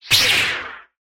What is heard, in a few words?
lightsword turning on; soundscrate